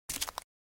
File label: Flip flops single step on concrete